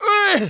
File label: prepare, alien; combat; creatures